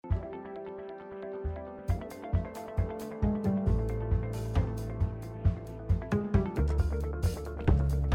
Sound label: bg music; The Cat Lady